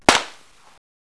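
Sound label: GUNFIRE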